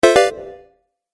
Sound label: Game App Surprise; video game